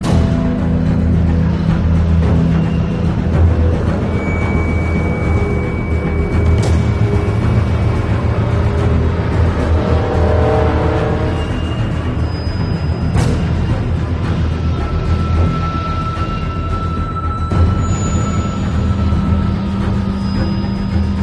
Loop Stealth; the walking dead season one

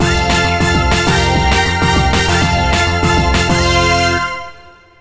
Jingle boss complete

